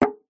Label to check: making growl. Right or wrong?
Wrong